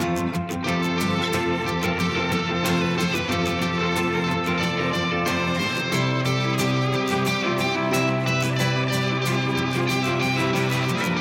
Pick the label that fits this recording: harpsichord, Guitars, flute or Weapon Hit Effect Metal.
Guitars